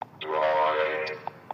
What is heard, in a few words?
droid android